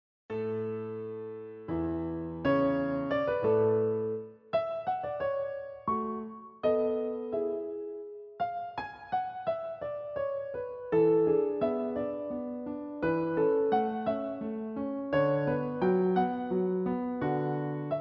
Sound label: Piano Sonata in A major Menuet; K.331 Second Movement